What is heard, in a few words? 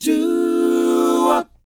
DOWOP G; ILIO; Vocal Planet